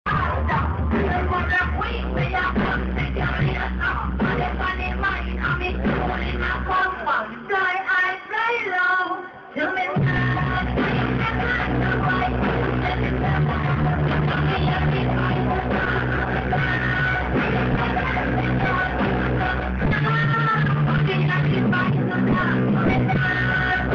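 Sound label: Drum n bass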